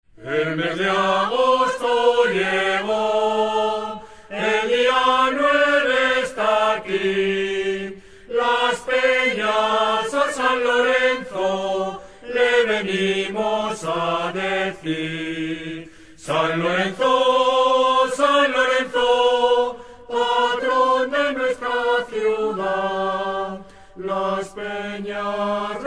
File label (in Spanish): himno cantado; huesca; san lorenzo